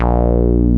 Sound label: wow bass; Dance; BASS SOUNDS; Masterbits; Dance Trax